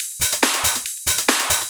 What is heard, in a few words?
Loop 140 BPM; Vengeance Samples